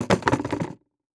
Industry Tool Box Rattle Box

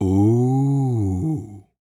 Human Male Ooh

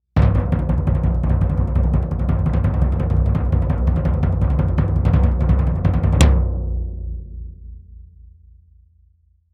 Cartoon Drum Pounding Bass

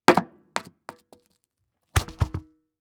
Impact Tire Bounce and Fall On Cement; Impacts

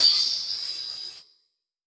Transition Sweep Medium; Production Element

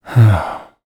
Human Male Middle Age Sigh

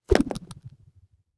Weapon Bow Recoil Elastic; AdobeCC

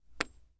Weapon Bullet Shell 3030 Drop On Sand; AdobeCC

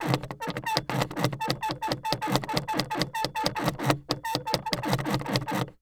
Suitcase Handle Rattle, Household

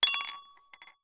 Household Glass Clink Two Coffee Mugs

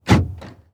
Truck GM Envoy Unlock Door Interior; transportation

